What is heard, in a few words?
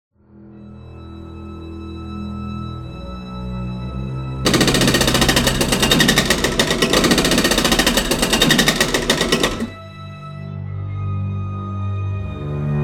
Middle Monster Engineer Wave; Fight the Horror; ps4 windows xbox one gamerip 2019